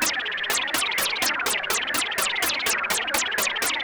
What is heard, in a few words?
Juno-60 Arp 125BPM